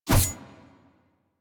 Melee Block Start; Warframe; Weapons; Whooshes

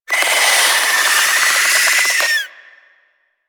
Infested Face Hugger Charge; Warframe

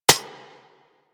Bullet Impact Generic Metal; Surface

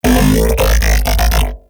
Mech Ambient Vocal

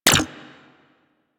Shield Impact; Warframe; Volt

